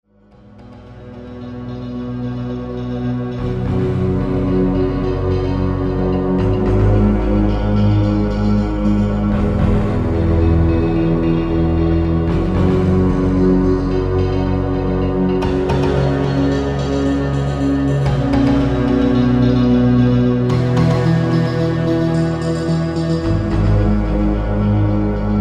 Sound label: Last Small Spark, Drum atic Atmospheres